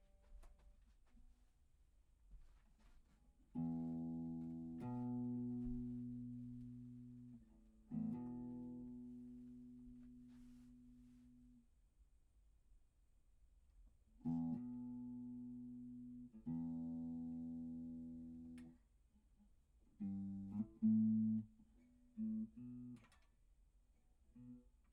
Act Guit L

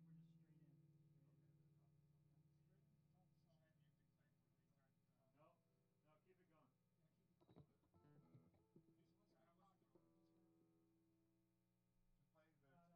GTR R84

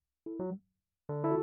Keyz R

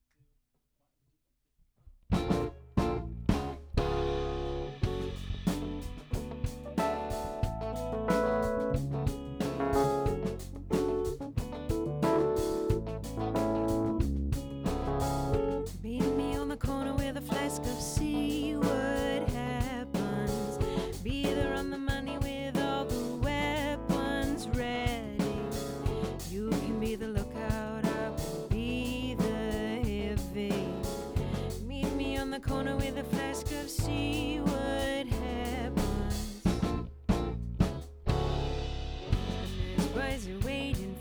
Rough Mix L

